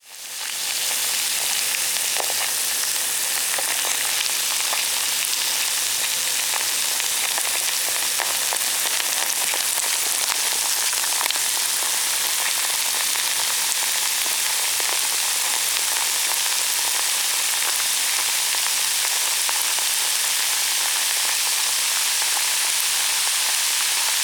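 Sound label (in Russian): acid corrodes, hisses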